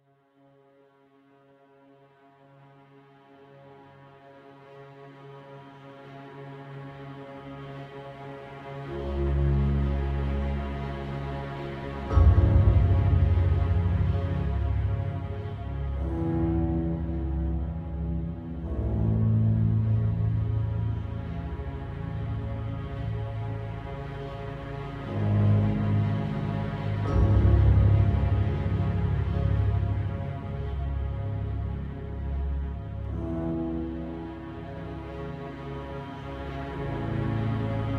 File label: Ballade in C Minor; Coronation